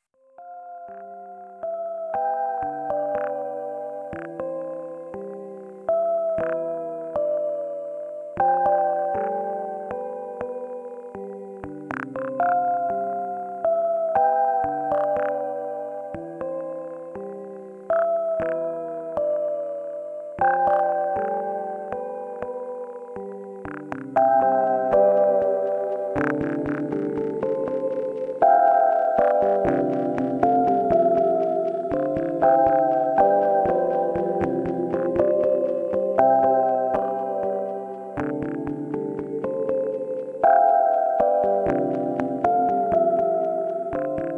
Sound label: Thirty six bells